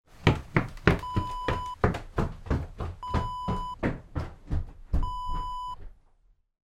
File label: footsteps wooden stairs up